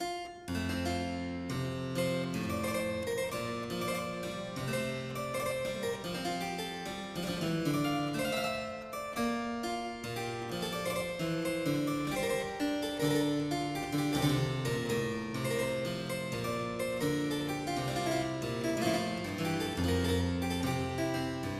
Suite in G major - Double de la Courante, Harpsichord; Jacques Champion de Chambonnieres